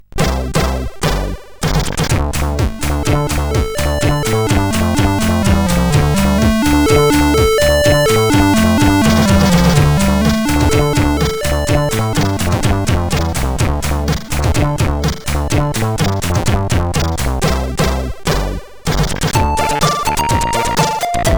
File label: Russian Gun, CSG8580R5